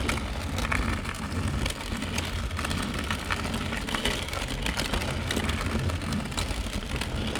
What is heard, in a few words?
scrape rough, wood box; physics; props